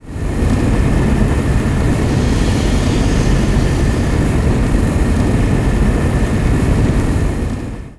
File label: AI Vent, Plasma; SpecialFX; NanoFXv2